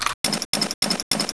usp silencer on, weapons; Counter Strike Model Pack; CS:S

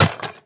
weapon sniper impact; physics

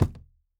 phys cube sm; Portal 2

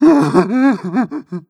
breath long, zombie

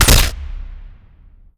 bolt huge blast

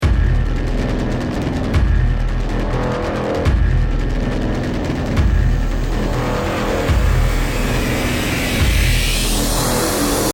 round ten sec count, music

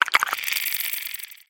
curious, clams; infestation

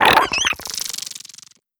idle, headcrab black, creatures; vox

